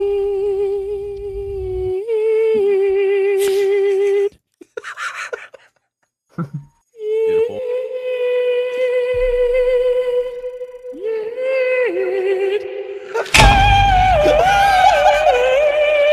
Yeet Opera

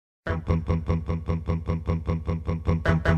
Messaje A Capella

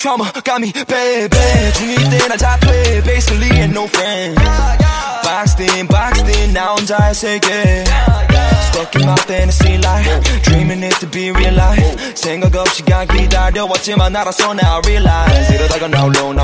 HipHop

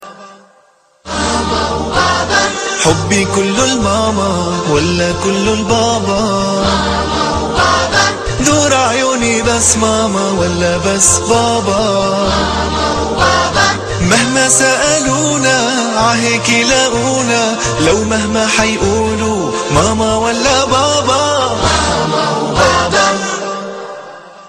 Arab

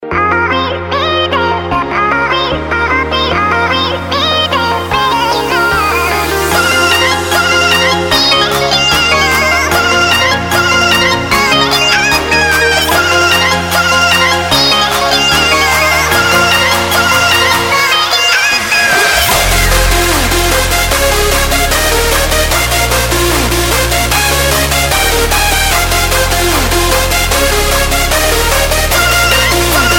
Fast ring

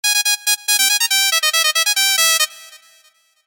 Beeps

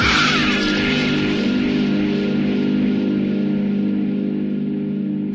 Bass Hit; Haruko

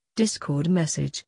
Discord Message

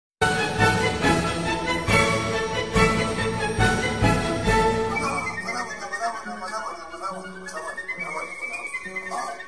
Piano

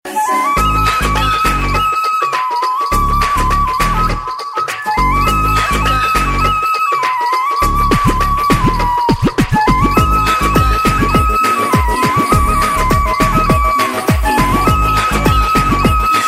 Flute

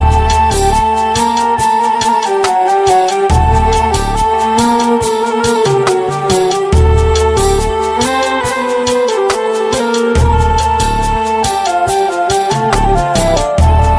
Theme Music

